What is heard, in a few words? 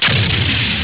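explosion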